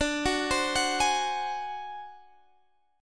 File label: D 7th Flat 5th D down Guitar Standard; arpeggiated; capo 9